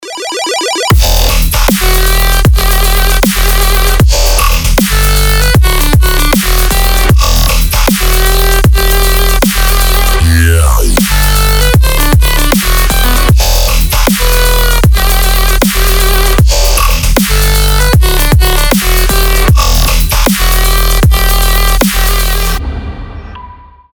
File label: keygen